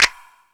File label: Crash, Drum Machines E-MU Pro-Cussion